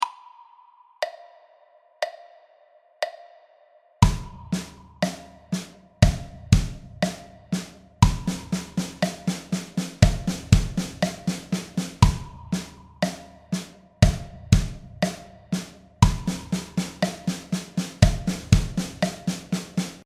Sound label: 4 way, drum